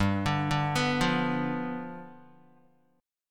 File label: G 7th G, Guitar; G7 arpeggiated